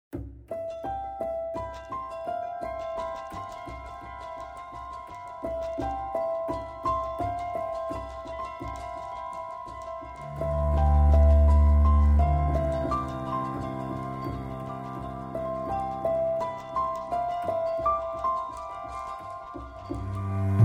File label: Sweet Raindrops